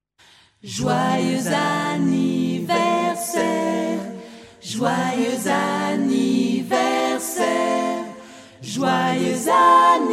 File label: joyeux anniversaire happy birthday